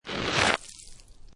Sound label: Snow And Ice Ice Scrape With Ice Fragments; Weather; Lynne Music